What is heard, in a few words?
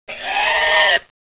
horngun idle